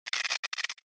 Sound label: Electric Shock Short